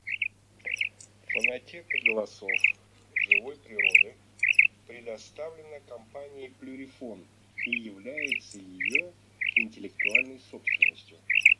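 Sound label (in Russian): Перепел Quaglia, chip mini для манка; plurifon; chip mini 20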